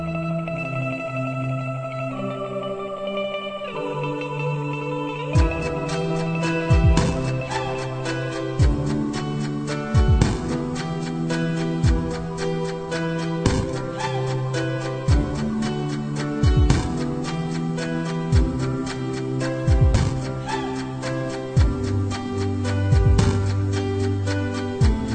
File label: karaoke